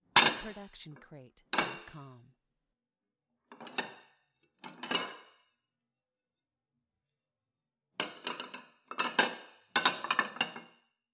stacking dishes; soundscrate

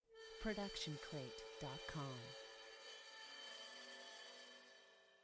crash cymbal 16 inch scrape; soundscrate

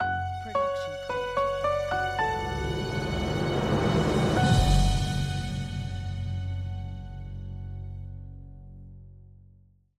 Mystery Ident; soundscrate

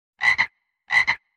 frog; soundscrate

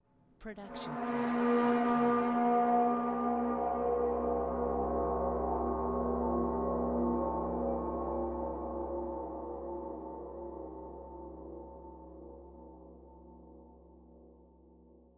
Metallic Magic Movement; SoundsCrate

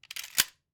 Shotgun Loading; SoundsCrate

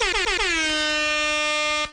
air horn; SoundsCrate

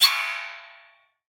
Evil High Scrape; SoundsCrate

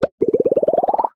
Stylized Bubble Pops Ascending; SoundsCrate

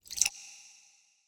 water swell; soundscrate

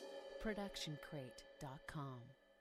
crash cymbal 16 inch; soundscrate

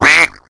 comic duck; soundscrate

